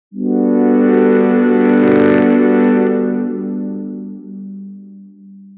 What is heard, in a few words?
Slow Burn; jup8